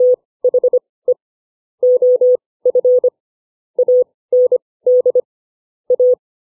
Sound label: effective wpm